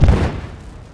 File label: strider step; Half Life 2